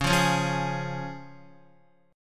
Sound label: C sharp Major, Guitar; strummed; JGuitar